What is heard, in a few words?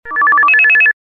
Electric Page; LG